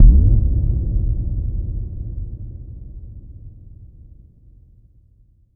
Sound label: VFX2 Sub